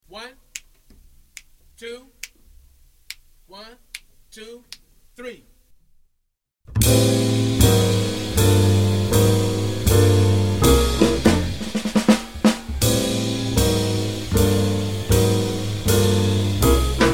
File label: minus melody